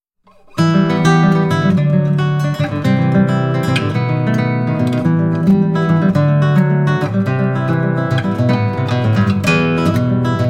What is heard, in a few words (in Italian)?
Danza Cubana Popolare Chitarra